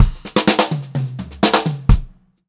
Pop-Rock Drumloops; 126 POP

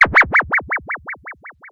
Sfx, Trance; Zero-G Trance Inducer; General Sfx

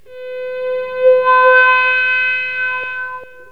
MEOW WAH, Distortion Gtr; PlatinumPhattCD2; E-MU Producer Series Vol. 8 – Platinum Phatt

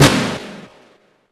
SNR, Drums; Polyend; Simulacrum Sounds; 1 Shots

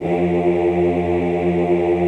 OOH F1, Classical Choir; Best Service; Extended Classical Choir

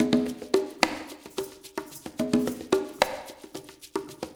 PERCMX; Spectrasonics

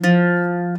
CG HARD F2 R, Celtic Flavours; USB Soundscan vol.42; AKAI

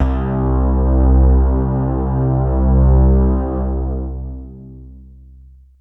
Techno leads, hits and riffs

